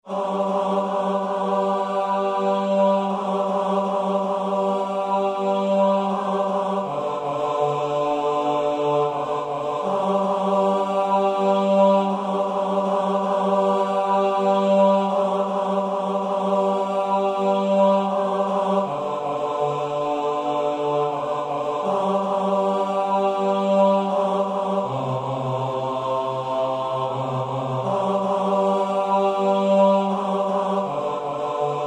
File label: Siyahamba BAS